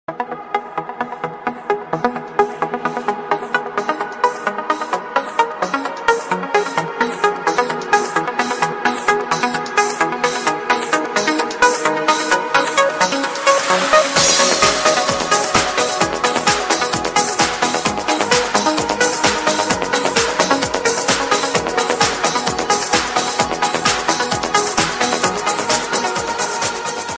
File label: Electronica